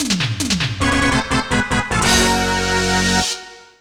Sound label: Game Over